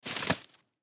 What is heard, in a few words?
Snow Rope Drop; Weather; Snow Sound Effects; Part of Snow (Sound Effects:Weather); Blastwave